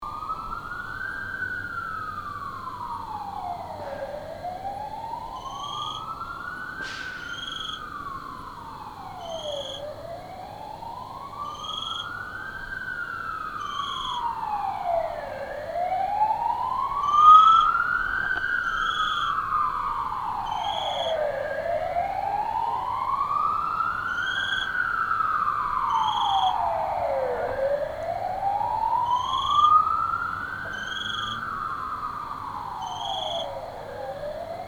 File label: ambience late night disturbance with sirens followed by with eerie quiet and single cricket